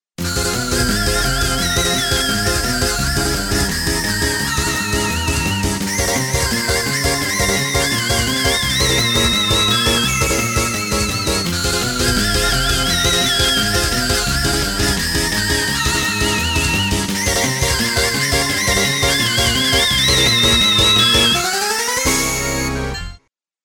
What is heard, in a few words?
Electronic Circus; Dragon Ball Z Best of - Volume 2